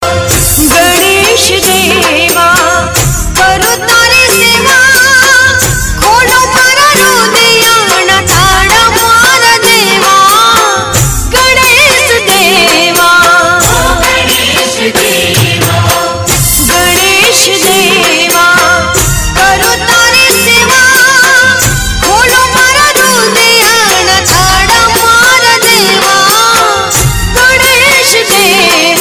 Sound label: Gujarati Female; Ganesh Chaturthi Special Ringtones